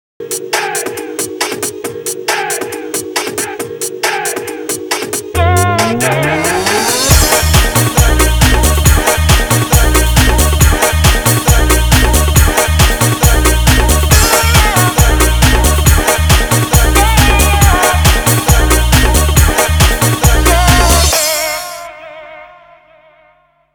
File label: Win Cup; Revolt